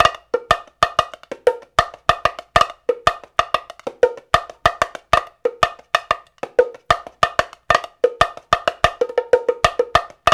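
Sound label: 93 bpm, bongo tonga; Spectrasonics; Supreme Beats; African Contemporary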